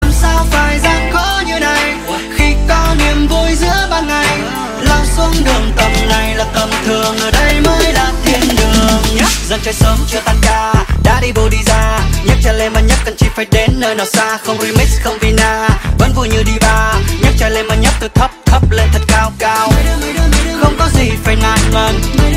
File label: Remix